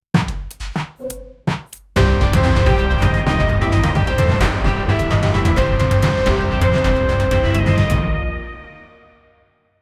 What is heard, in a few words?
intro police crime, crime